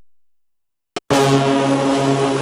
dirty diesel

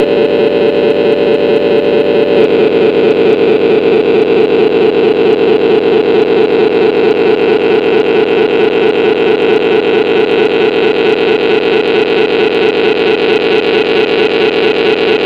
WHINE TO RATTLE

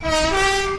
2 TONE OLD

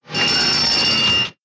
old police 60s

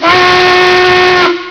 whooter

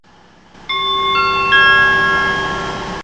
CHIME TANNOY

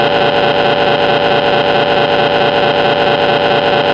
DIESEL WHINE

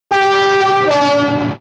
reverse 2 tone

